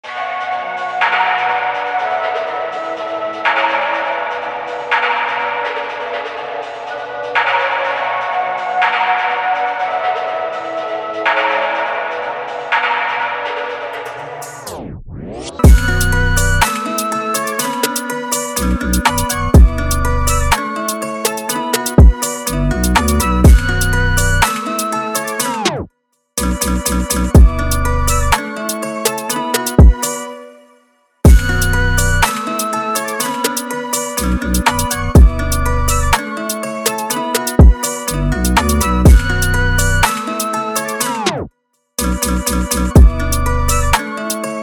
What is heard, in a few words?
Drill